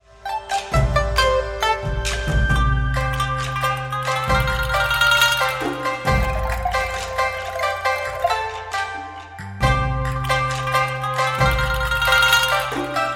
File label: Happy China Style, RINGTONE; MIUI12